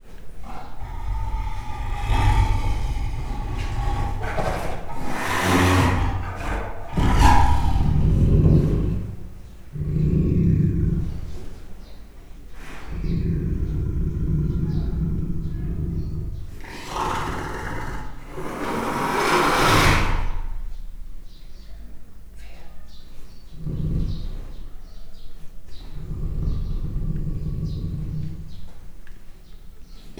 ketrecben morog horog fujtat madarak, bengalitigris; miskolci zoo 2009

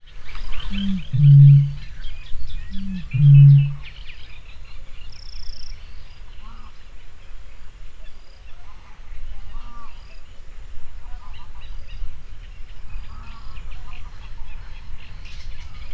kettőször, bölömbika; hortobagyi nemzeti park 2013

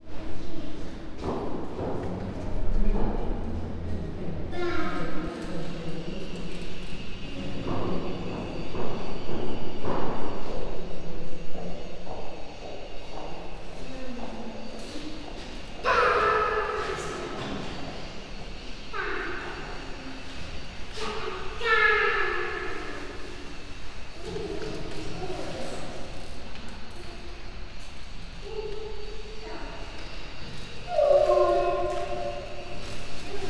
trillázó kislány dongó plexifal, majomház; veszpremi zoo 2013

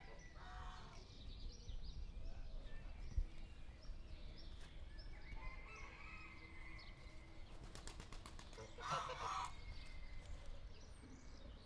libák, háttérben szövegelés; nyiregyhazi zoo 2010

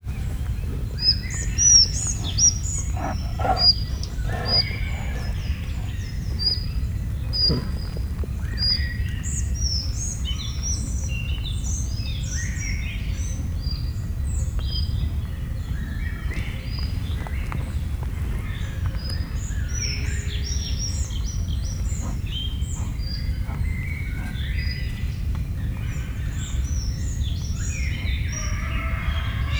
lihegve járkál madárkakas, bengáli tigris; miskolci zoo 2009

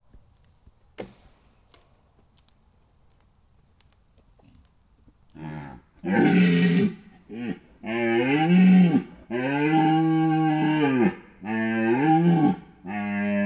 gimszarvas mászkál kerítés zörgés; budakeszi zoo 2010

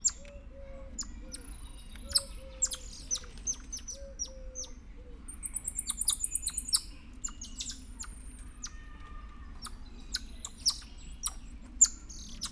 ezüstös selyemmajom aranyoroszlánmajom

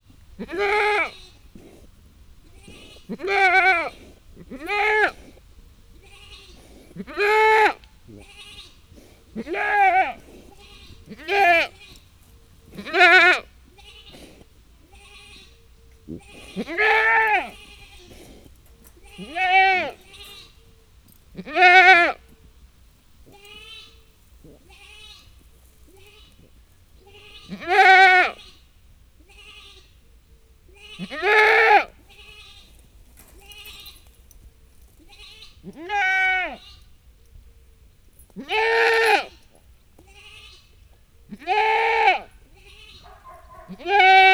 gida mamaja, hazikecske; parasztudvar

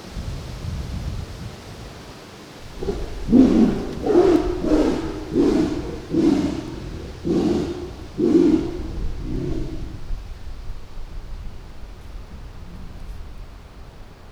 két állat összekap palánk mögül szél, szibériai tigris; veszpremi zoo 2013

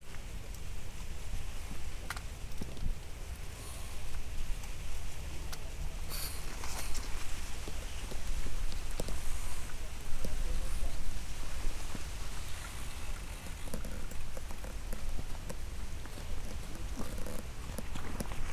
ponty kifogasa szel; recsk

